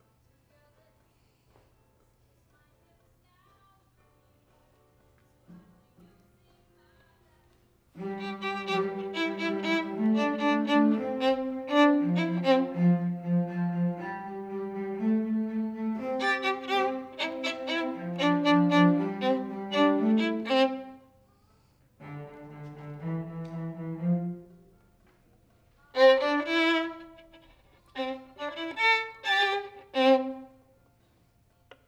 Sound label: Violin